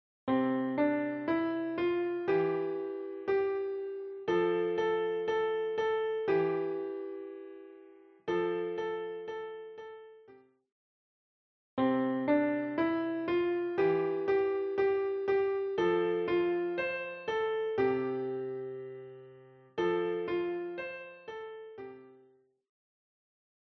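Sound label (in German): Kinderlieder, Klavier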